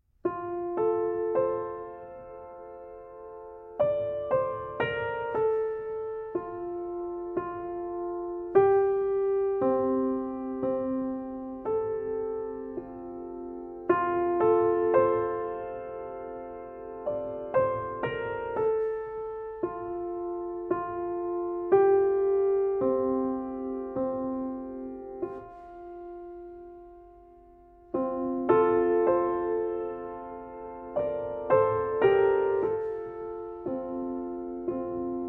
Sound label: Did You Ever See a Lassie (Instrumental Version)